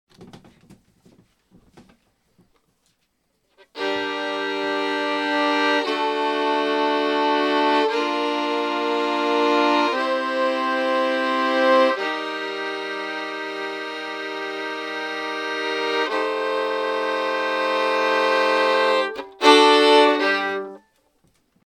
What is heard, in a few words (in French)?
Grille brunette 4 voix ensemble